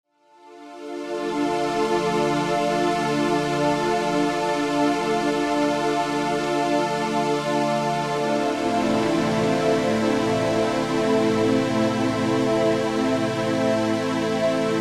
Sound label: Pad; Neon Genesis